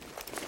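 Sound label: distant short sound of sewing on a sewing machine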